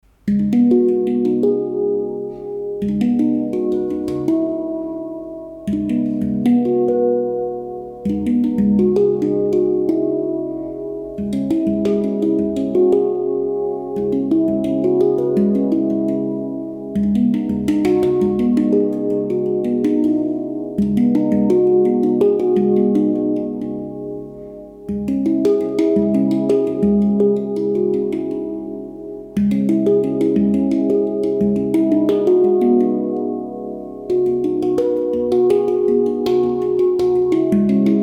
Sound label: Handpan